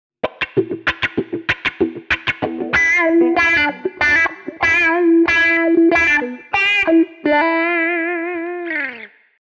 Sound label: guitar Wah